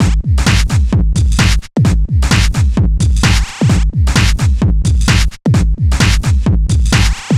Solid Loop 130, Loungin House; Full Loops 130; Equipped Music

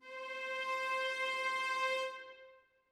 viola leg C5, Strings; BBC

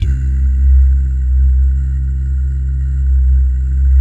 BS DOO, Vocal Planet Jazz & FX; ILIO; JAZZCOMBO

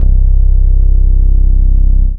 DM BAS, Dance Mega Synth; Best Service

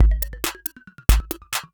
percussion loops, 138, Techno

